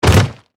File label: Sports Football Tackle Impact